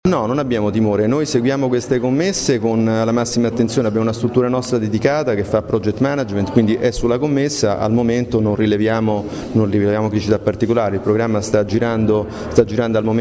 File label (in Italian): Soprano